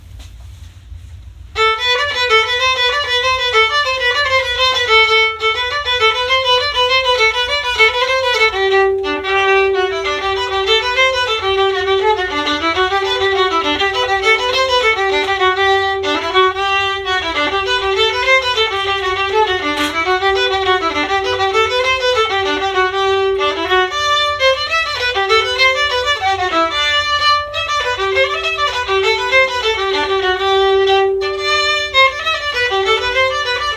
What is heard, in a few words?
Viola, christmas concert practice clips